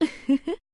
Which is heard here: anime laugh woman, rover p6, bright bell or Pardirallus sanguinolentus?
anime laugh woman